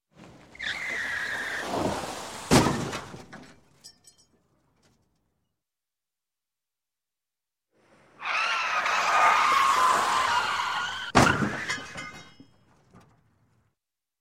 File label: Crash, Auto Skid, Large Crash, Skid; Sound Ideas 2000 Series